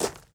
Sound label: Footsteps Gravel Run